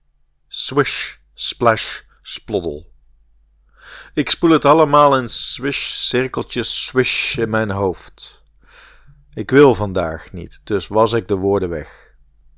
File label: Swish splash sploddel